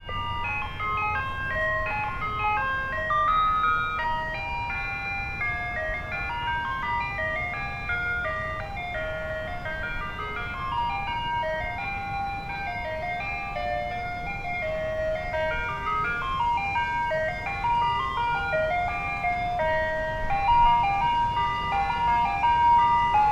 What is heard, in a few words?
Ice Cream Truck